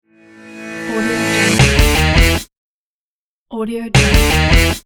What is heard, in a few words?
short rock logo